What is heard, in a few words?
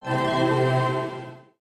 magic cure